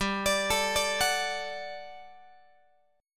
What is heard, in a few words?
G Major 7th Suspended 2nd G down Guitar; GM7sus2; arpeggiated; x,15,17,19,18,17